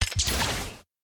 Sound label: aircutting jump